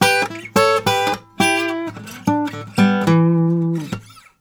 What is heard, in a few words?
Blues Guitar; ILIO; Fretworks; LICK